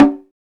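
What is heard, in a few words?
PERCUSSION; E-Lab; X Static Goldmine 1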